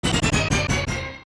Power Down; Power-up finishes

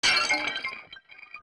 Pot Shatter; zelda; OOT

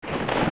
Flap; Kaepora Gaebora

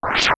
Sword Spin; Sword-spin attack; Zelda

